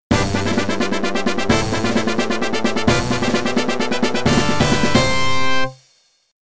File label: Boss Stage Clear; Bang Bang Busters; arcade